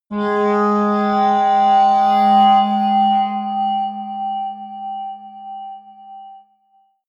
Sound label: atmospheric feedback synthesizer medium, drones